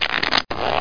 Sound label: shuffle Sound; Jogos de Azar; blackjack